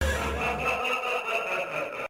hahahaahaahaha

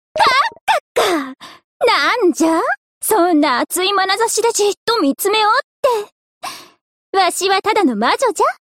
kakaka

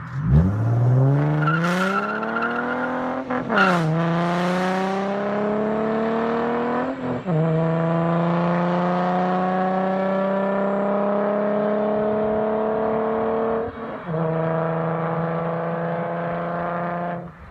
mustang v6

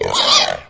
guard hurt; gammorean guard; lego star wars

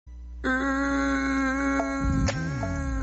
uhuuuuuuuu

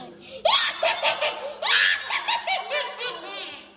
mwahahaha

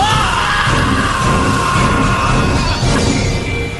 membrane scream

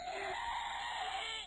screaming externally

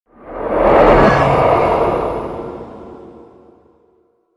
noises; shadow

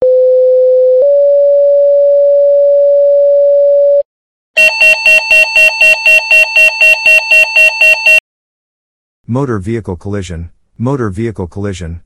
motor vehicle collision

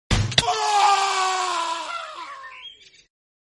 minecraft death sound

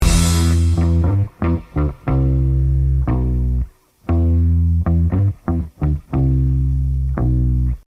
bass line

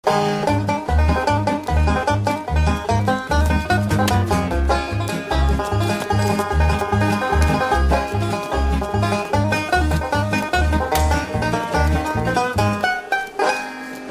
scrubs banjo german

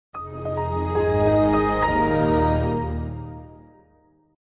microsoft windows xp startup sound effect

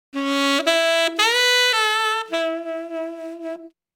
opening riff

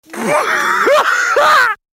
haha smeh

